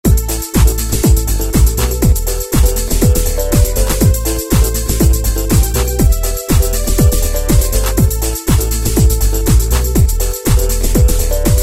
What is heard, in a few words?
House Pattern, Roland MC-303